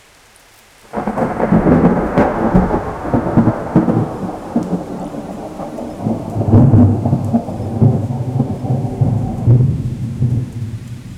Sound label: jungle ambience rain and